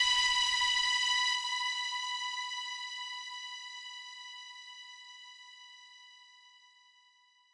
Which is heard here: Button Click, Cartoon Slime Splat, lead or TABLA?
lead